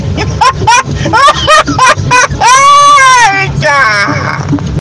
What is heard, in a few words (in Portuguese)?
risada